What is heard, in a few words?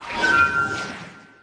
Device Connect